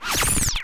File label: single reel stop